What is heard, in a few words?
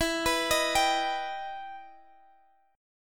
E Minor Major 7th E down Guitar Standard; EmM7; arpeggiated; capo=2